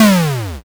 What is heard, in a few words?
explosion m